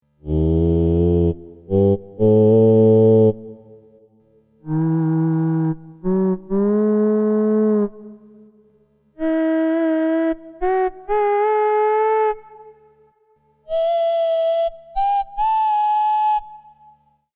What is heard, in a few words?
theremin